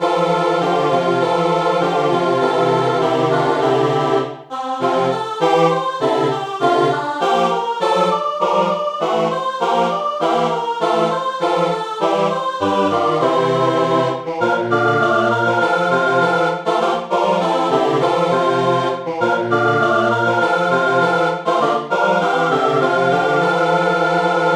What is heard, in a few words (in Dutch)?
Fijn liefje, SATB